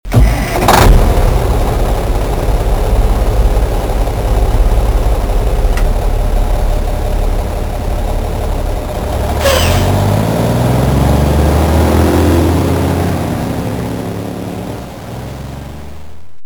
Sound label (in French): Camion